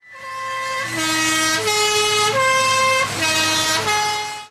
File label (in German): PostAuto klaxon; les trois sons qui font la Suisse